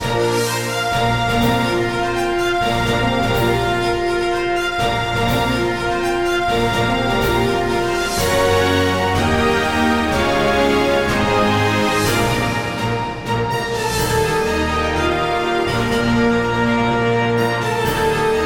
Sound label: stock music